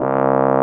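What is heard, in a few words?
brass, Solo T.Bone; Roland L-CD702; Solo Bone 4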